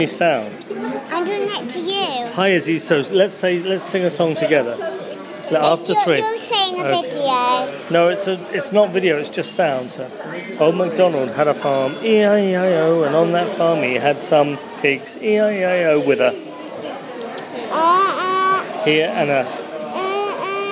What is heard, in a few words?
singing